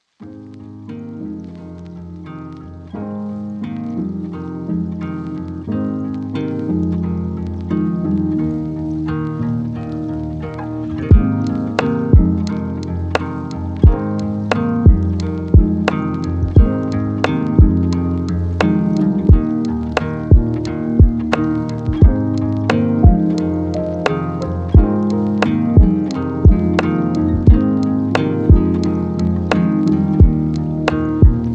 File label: lofi hip hop